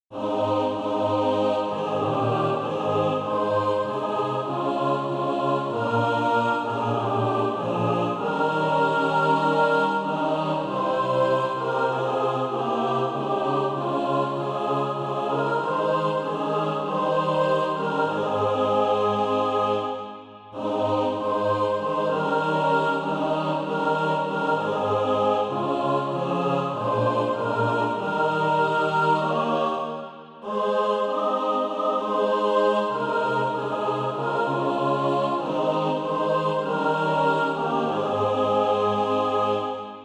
mixed

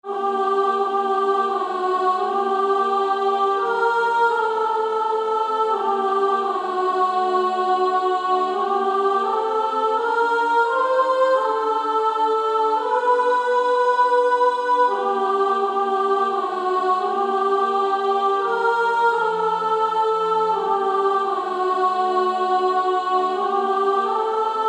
Female Choir; 1st Soprano; Coventry Carol

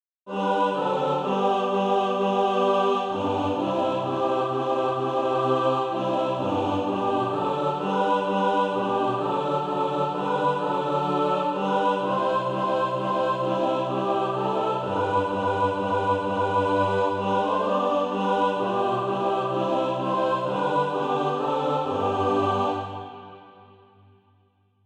mixed

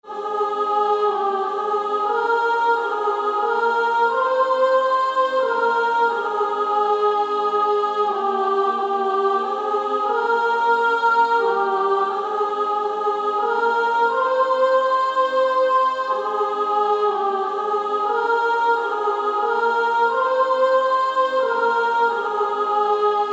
Female Choir

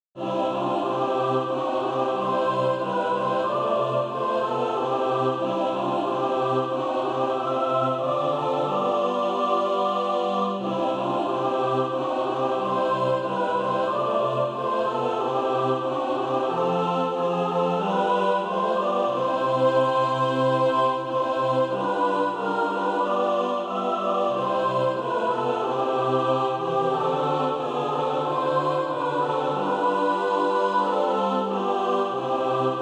mixed; A Song in My Heart

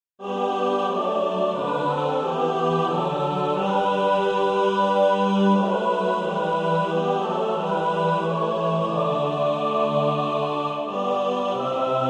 mixed; Rejoice Ye pure in heart

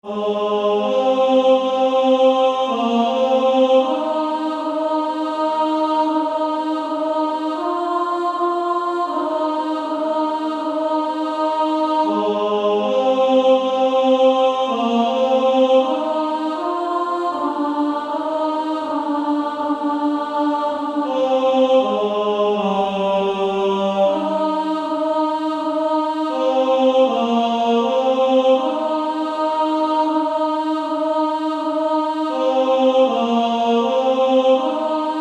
Female Choir

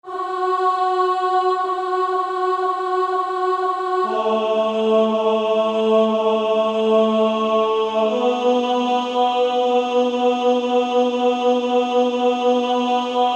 Female Choir

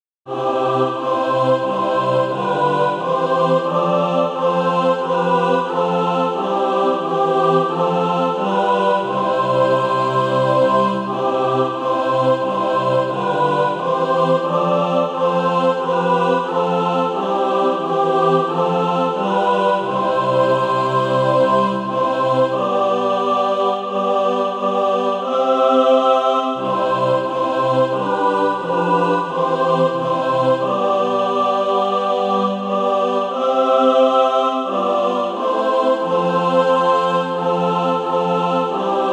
mixed

